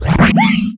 alianhit; combat; enemy

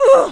hurt, combat creatures default she; CosmicRageSounds; chickens and more chickens

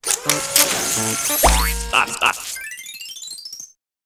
drone build, factory; CosmicRage

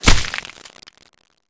flesh; CosmicRage; general combat weapons generic rifle

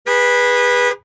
honk, highway

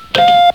button push, ships; Cosmic Rage; ships misc

